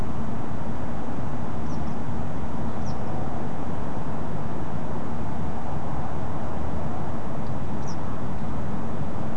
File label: Eastern Yellow Wagtail calls; nocturnal flight call; Beijing